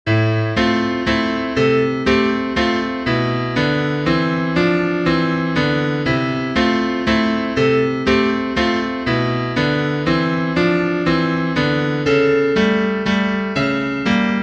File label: piano